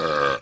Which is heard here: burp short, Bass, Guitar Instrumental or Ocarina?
burp short